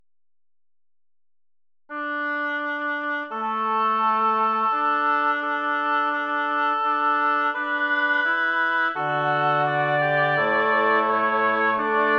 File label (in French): Credo, surRe, claves, Tutti; Certon; Missa Christus Resurgens; Polyphonie